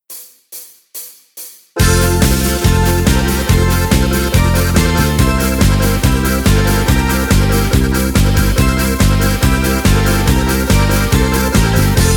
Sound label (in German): Karaoke ohne Harmonika mit Chor